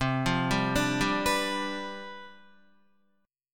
C-Major 7th Suspended 2nd Suspended 4th Guitar, CM7sus2sus4 chord; arpeggiated; 7,8,7,7,7,7; JGuitar